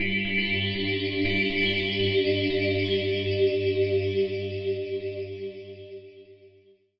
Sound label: Digi Mass G2, synth